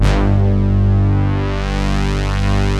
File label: SYN BRS & CHOR